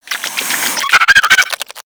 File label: Infested Biped Warning; Warframe